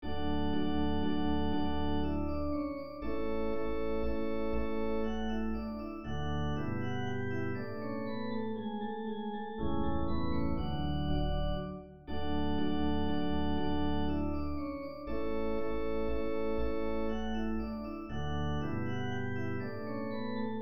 danse allemande, ré majeur; haydn joseph

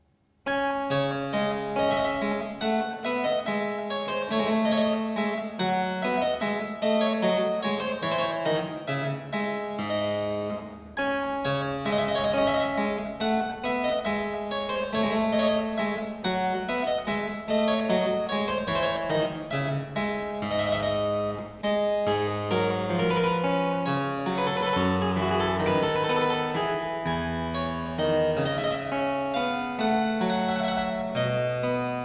menuet

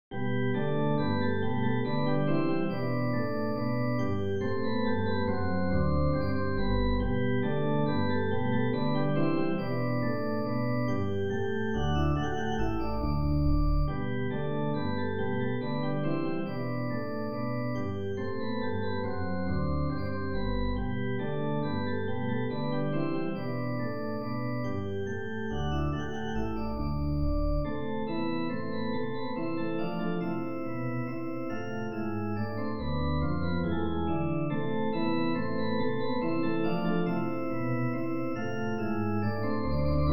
gavotte sol; graupner christoph

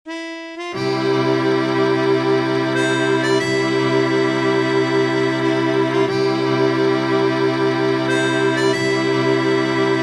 hymne

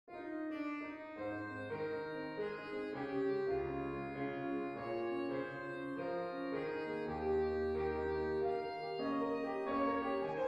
valse; beethoven ludwig van

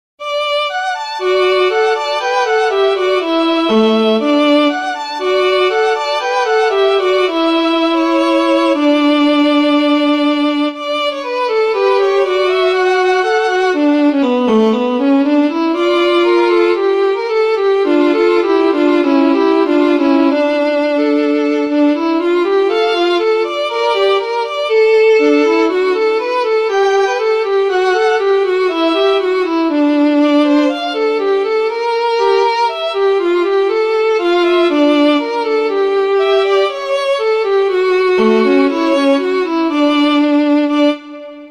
petit duo en re pour violons; aubert francois

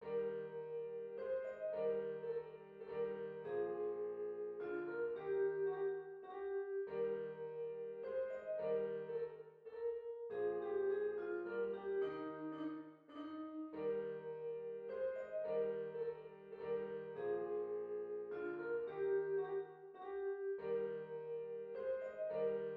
danse allemande mib majeur; haydn joseph

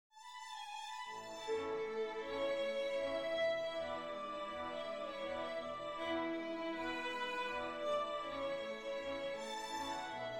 menuet quintette cordes; boccherini luigi

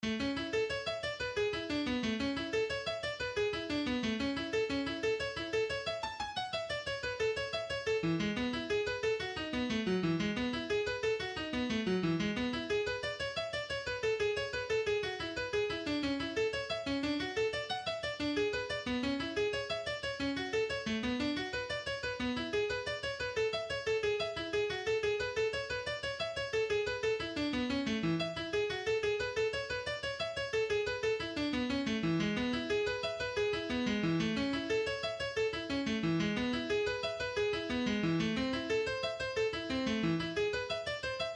etude partie piano partie alto; kreutzer rodolphe

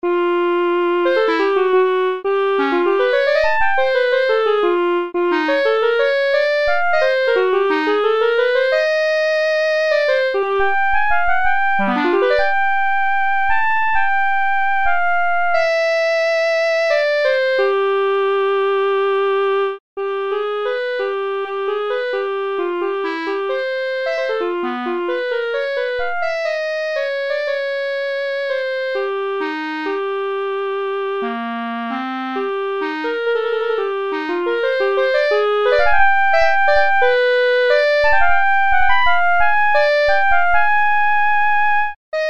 quintette pour clarinette cordes

